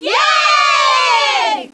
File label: happy crowd; Princess and the Pea; SoftKey